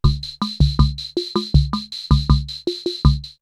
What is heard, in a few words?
CR78 R77 BeatBox, drumloops, DrumMachines; X-Static Goldmine